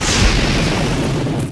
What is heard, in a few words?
earth cast; DAoC